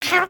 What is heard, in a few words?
Trex Tonal Chompy; creatures organic